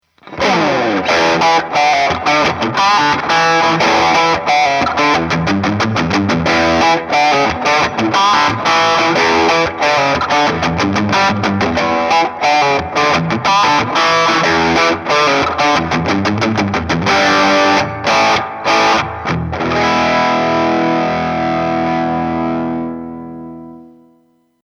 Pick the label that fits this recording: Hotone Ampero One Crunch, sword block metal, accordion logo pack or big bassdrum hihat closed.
Hotone Ampero One Crunch